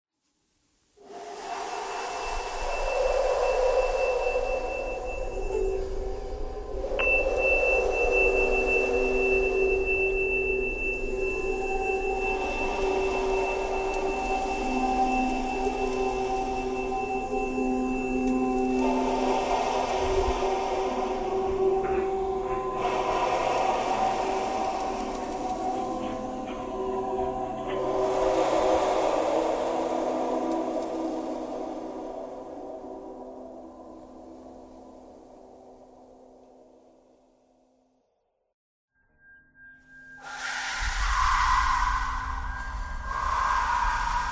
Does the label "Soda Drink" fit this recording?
No